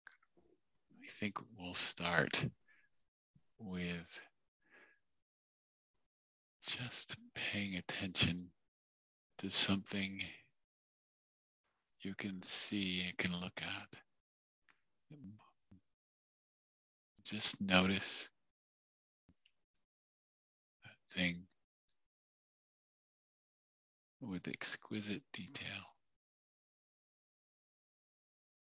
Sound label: visual breath sound